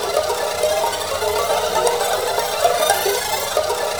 ORCH; MELODICS

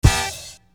Horn Hit, Hip-Hop; Construction Kit; Sonic Foundry (Sony Creative Software)

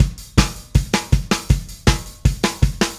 Happy Pub Beat, Indie Rock; Sonic Foundry (Sony Creative Software); Indie Rock Anthems; Session 05 - Happy Pub

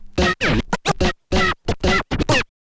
scratch kit, vinyl

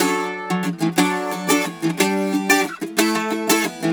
Celtic Flavours; USB Soundscan vol.42; AKAI

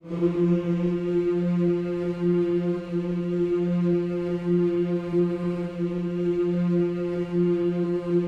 HMMS F 3, GOSPEL HMM; Spectrasonics; Vocal Planet

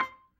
B3 PERC, Vintage Keyboards

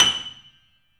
3D Pianos, F#

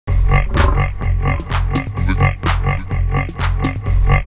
drumloops Techno House 127; Horny Frogs; X-Static Goldmine

